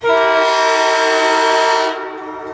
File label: emd e9 horn